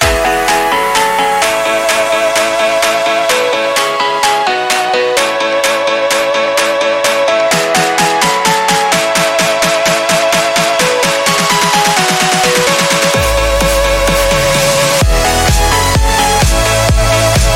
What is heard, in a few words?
EDM Sport Summer Music